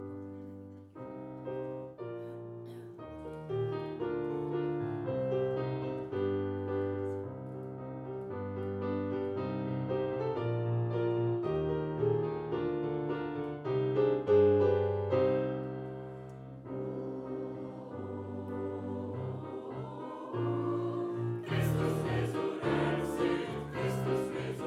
Taize

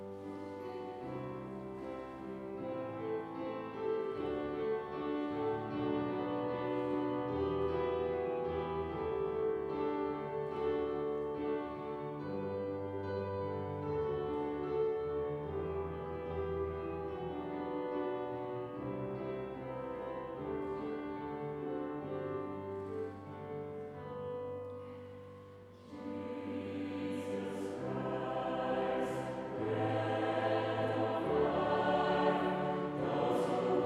Taize

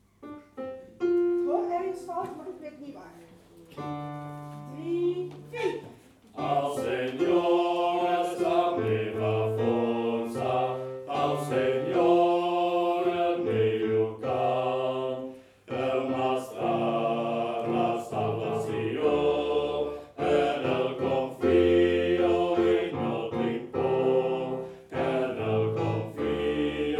Taize BAS; SINT-CECILIA KOOR ROTSELAAR

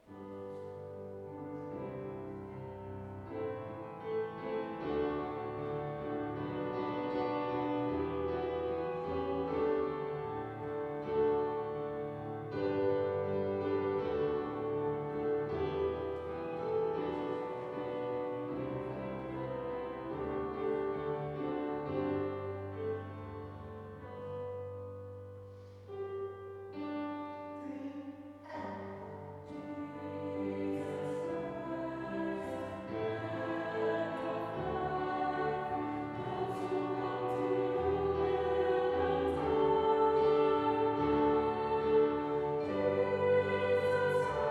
Taize SOP

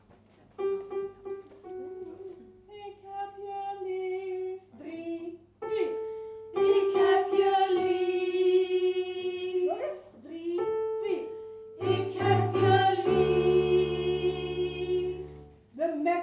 Pastorale alt; SINT-CECILIA KOOR ROTSELAAR

